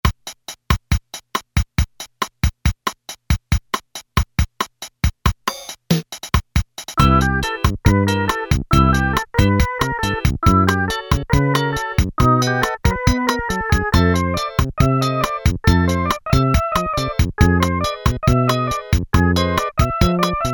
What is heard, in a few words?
Bossa Nova, Casio MT-600 Rhythms